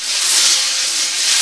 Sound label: BRUSHES, JAZZ